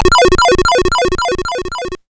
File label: Hard PCM Chip; chiptune